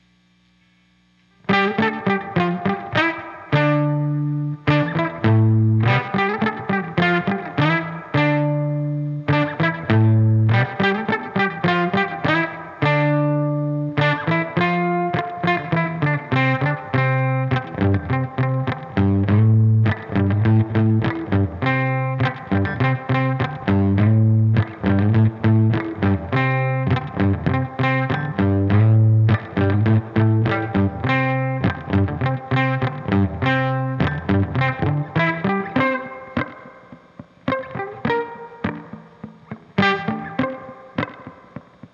guitar rhythm

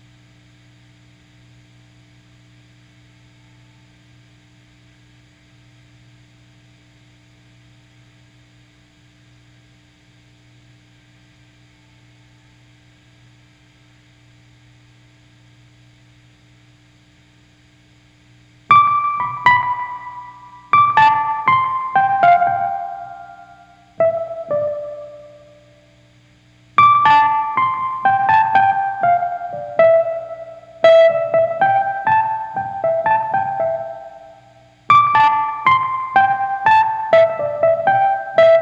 guitar

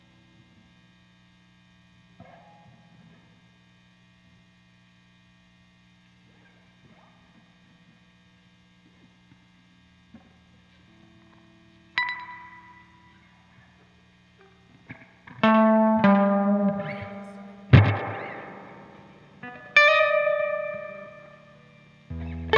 guitar overdub; guitar overdub gareth

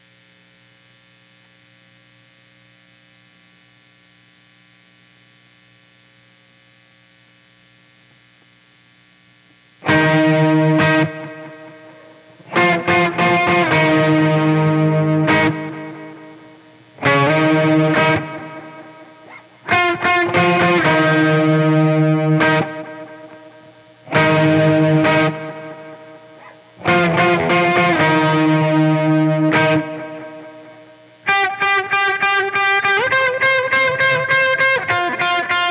guitar

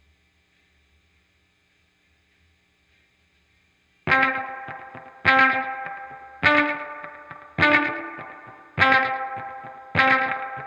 guitar volume swell

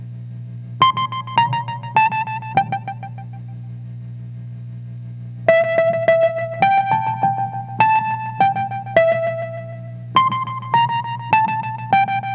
wurlitzer

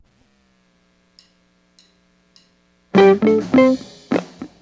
guitar

